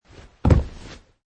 Landing; Man wearing jeans and hiking shoes jumping and landing on carpet surface - variation 5; Wrestling And Struggling; Wrestling And Struggling Sound Effects

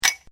GLASSES STACKED SINGLE, Kitchen glasswear; Sound Effects:Bars; Foundation3

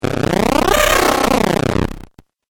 Game Glitch Effect, Video Game Sound Effects

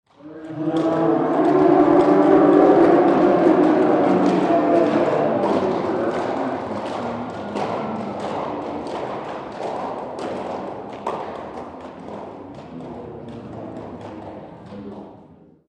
Cheer Football crowd Interior; Crowds; Voice

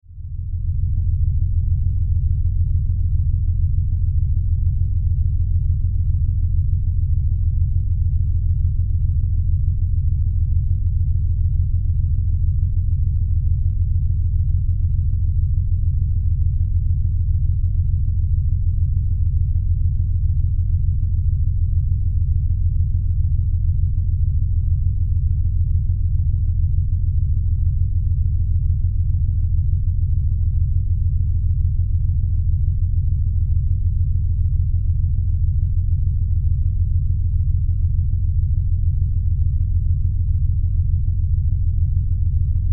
Science Fiction Power Drone Steady; Sound Design

